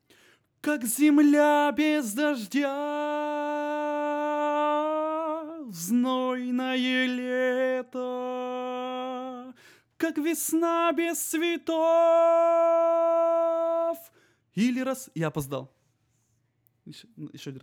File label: tenor